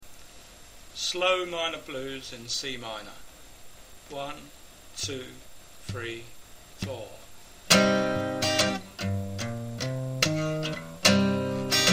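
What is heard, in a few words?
slow minor blues, guitar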